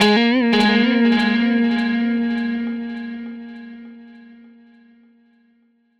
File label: Strat Guitar Loop; Sonic Foundry; Six-String Orchestra